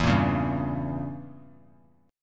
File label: E Diminished 7th E; Edim7 strummed; JGuitar